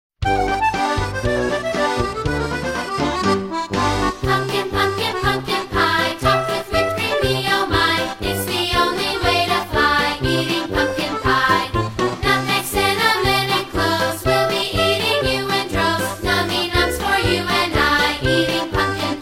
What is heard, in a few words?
polka